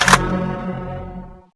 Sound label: pickup